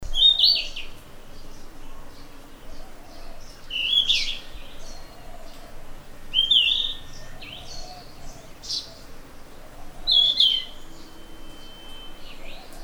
Ficedula zanthopygia song, birds of the ussr; passeriformes; muscicapidae

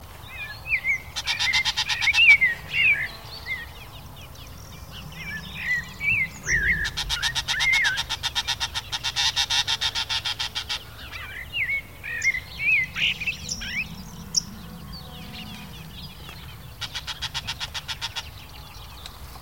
Lanius senator alarm call; passeriformes; laniidae; birds of the ussr

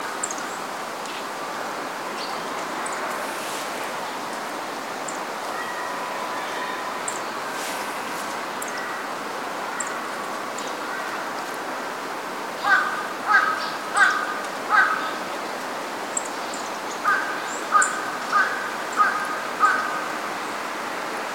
Cristemberiza elegans call; passeriformes; emberizidae; birds of the ussr

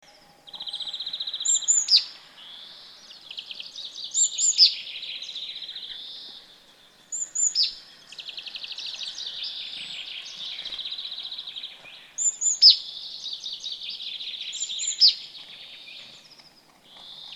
Parus major call; passeriformes; paridae; birds of the ussr

